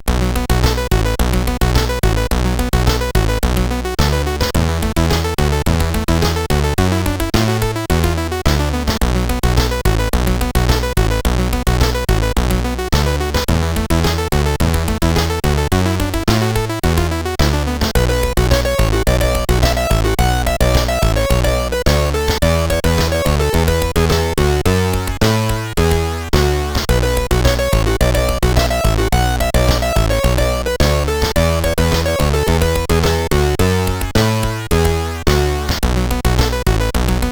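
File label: Lights On, MOS CSG8580R5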